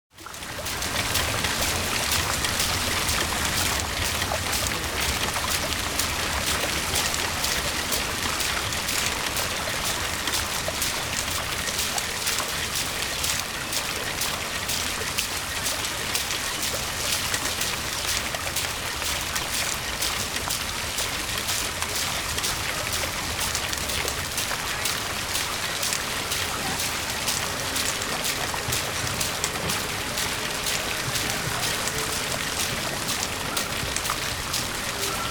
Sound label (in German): Tinguely Brunnen